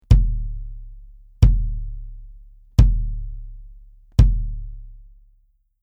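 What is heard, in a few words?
BASS Ctd Hard Plastic, bass drum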